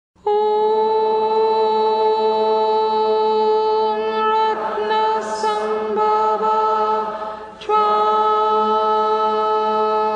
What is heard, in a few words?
Om Ratnasambhava Tram